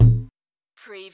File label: CARTOON BONK